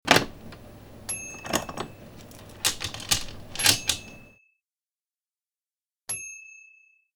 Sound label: Locking Door With Chain and Deadbolt